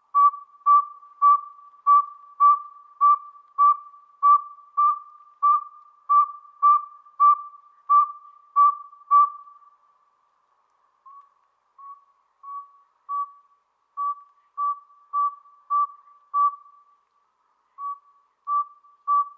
Northern saw whet owl

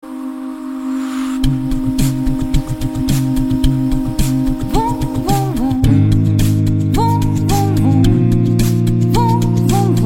Acapella

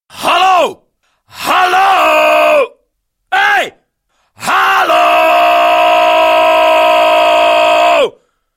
Hi Halo

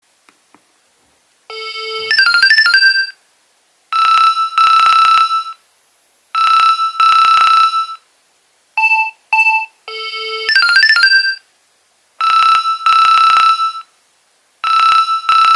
Nokia 2300 Ringtones

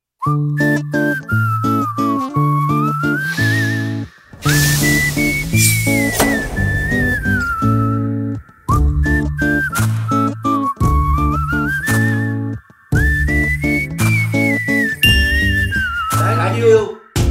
Whistle Instrumental

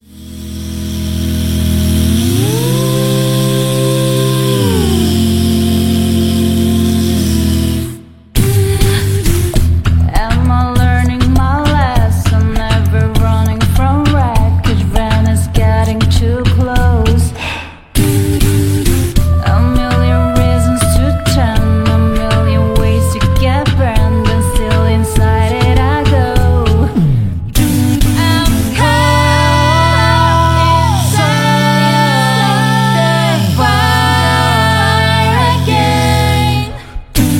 Acapella; VALORANT Champions

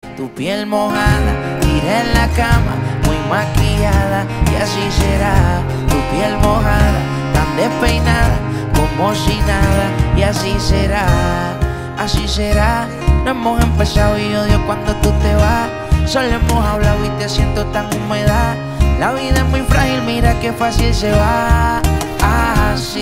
Acoustic

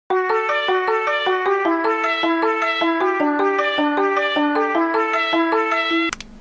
Xiaomi

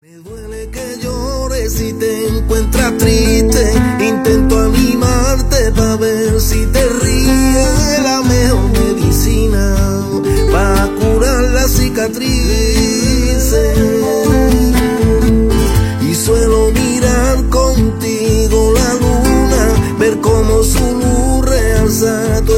Flamenco

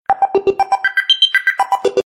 Samsung Robotic Message

